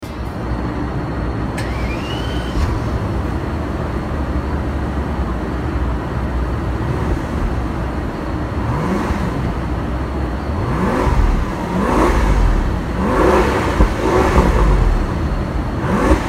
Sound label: Mercedes-Maybach